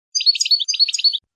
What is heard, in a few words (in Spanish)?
floreo tipoli tipoli; campero